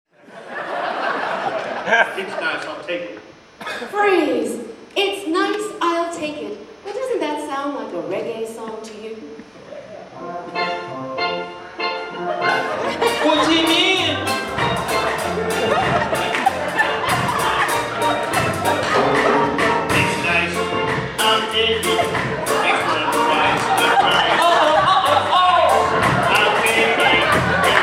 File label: reggae